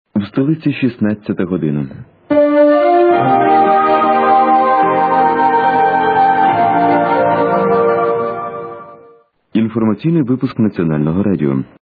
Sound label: interval signal; ur1; позивні 2001 р.